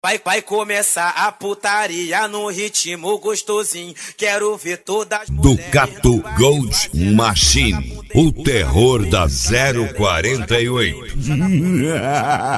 especial funk

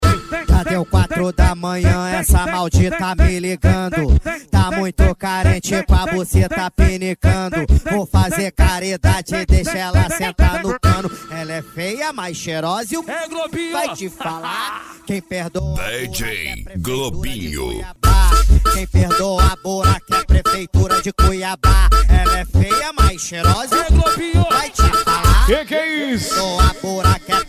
funk pisadinha sertanejo

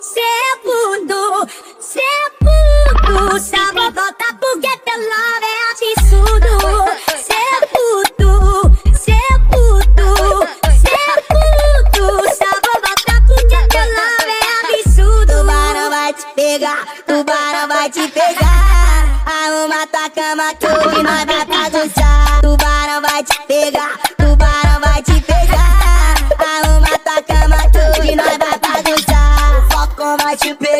funk mega funk

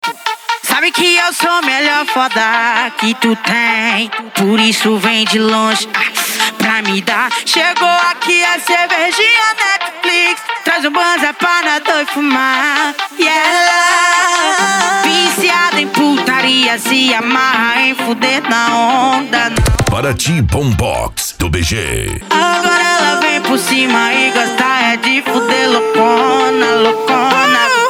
bombox funk

